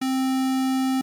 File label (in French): Onde Carree